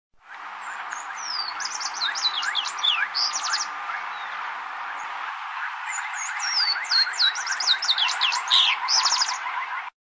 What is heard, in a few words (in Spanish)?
tordo renegrido, voz